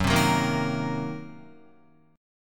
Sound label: Gb 6th Flat 5th Gb down Guitar; strummed; Gb6b5; JGuitar